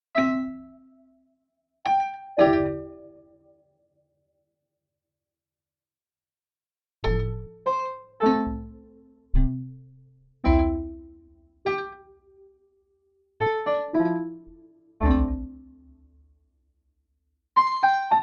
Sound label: quirky